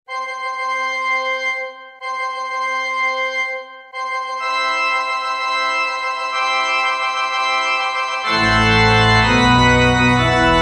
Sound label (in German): Hochzeitsmarsch Orgel Version